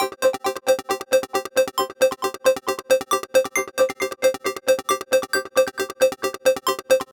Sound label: Echoes; Techno (Техно)